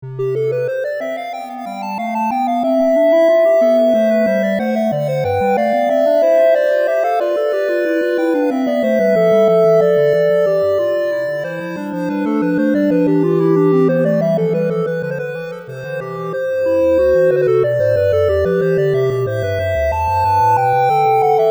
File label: for synths; 15 Sinfonias, BWV 787-801; Bach, Johann Sebastian